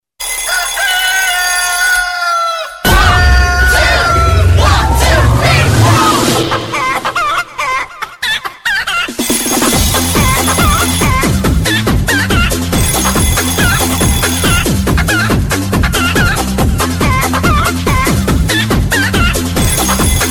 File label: Crazy Chicken Beats